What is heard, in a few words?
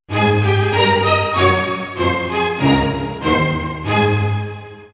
jingle